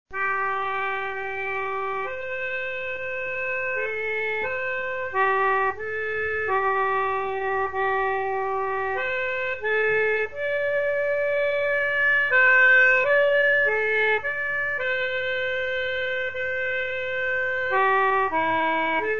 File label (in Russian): garmoshka